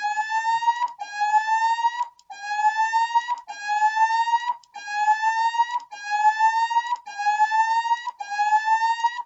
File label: Pre alarm